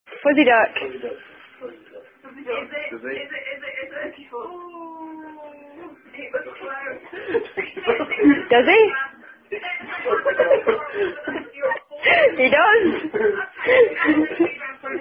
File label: Fuzzy Duck, Drunk, Ducky Fuzz Drinking Game